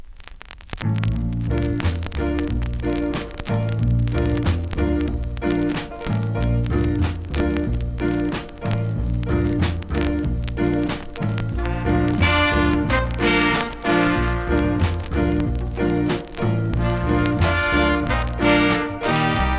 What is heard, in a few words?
JINGLE